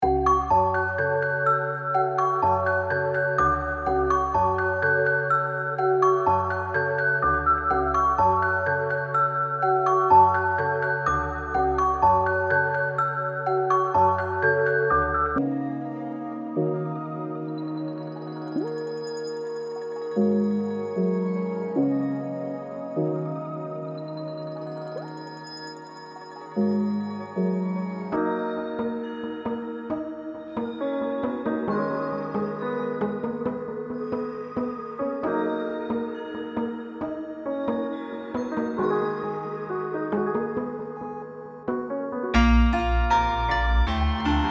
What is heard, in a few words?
Loops; Money Baggs